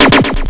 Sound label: grind, EMU XL-7